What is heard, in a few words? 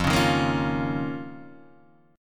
D sharp 7th Suspended 2nd